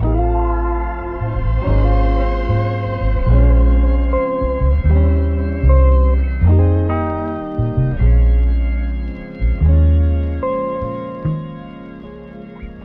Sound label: keys and guitar, phonky loops